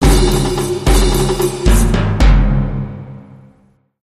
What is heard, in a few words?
Sting